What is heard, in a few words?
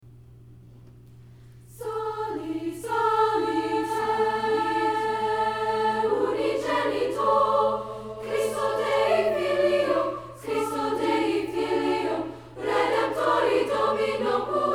Loud hum during a Choir Concert